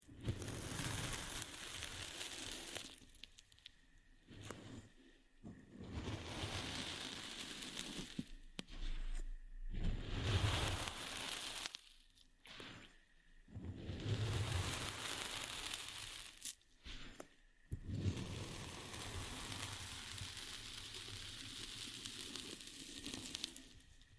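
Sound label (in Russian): разрезание песка песчаный берег песок ходьба; asmr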